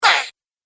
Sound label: Mini Monster Snarl; Monster Sound Effects; horror